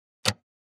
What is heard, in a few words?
1930 Radio Button; switches